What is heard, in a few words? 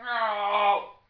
Groan